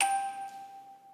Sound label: musicbox